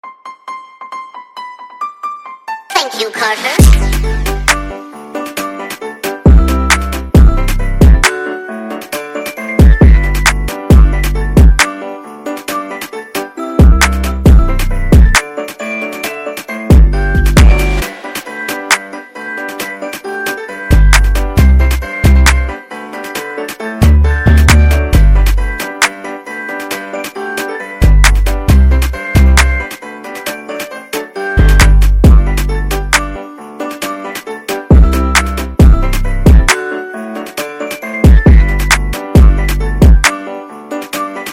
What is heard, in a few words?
DRILL REMIX JINGLE BELLS